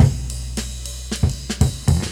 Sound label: bass note included, Drum loops